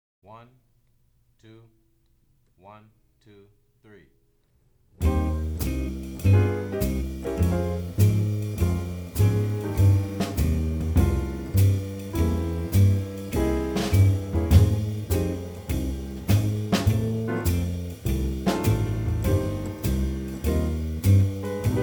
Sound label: C minor Blues, baixo; jazz